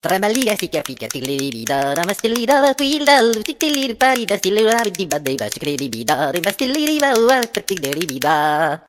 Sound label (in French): Sonnerie; donjon de naheulbeuk